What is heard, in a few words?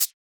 Shaker; FLPRO